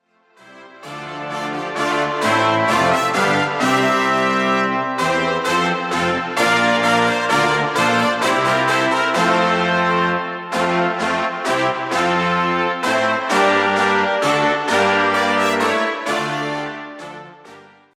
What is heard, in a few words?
brass